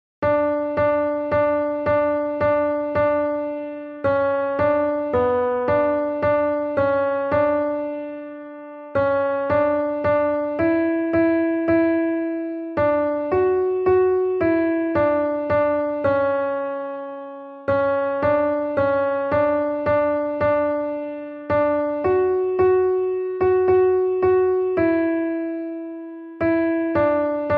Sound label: alto; the churchs one foundation